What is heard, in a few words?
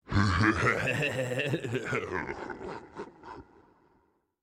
Laugh